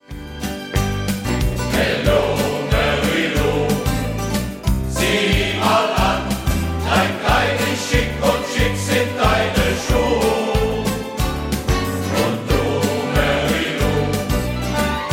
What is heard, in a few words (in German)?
Schlager